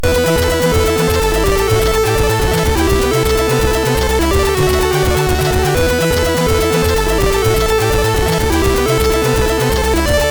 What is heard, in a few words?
Super Bass, MOS6581R3; sid